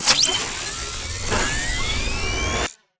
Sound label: spectre overload close; Titanfall 2